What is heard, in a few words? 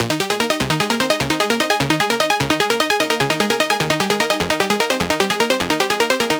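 synth loop i get emotional 150 F minor; Virtual Riot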